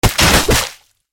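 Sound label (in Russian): двойной выстрел убивший наповал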